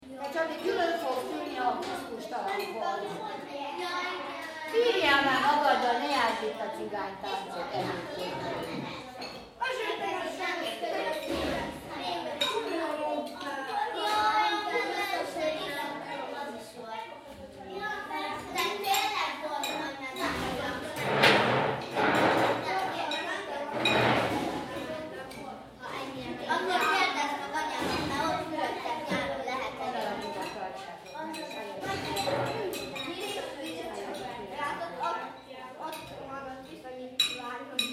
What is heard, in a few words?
Indoor Cafe Ambience, Small restaurant in Hungary, daytime; Indoor Cafe Ambience 2: Small restaurant in Hungary, daytime / lunch time. Adults and children chatting and dining. Happy, li; Restaurant Sound Effects